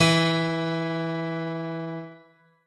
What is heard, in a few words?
piano